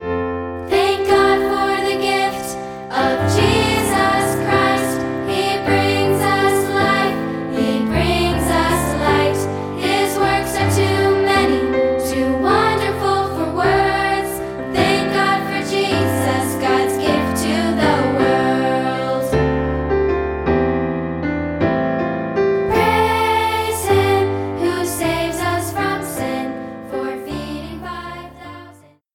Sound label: piano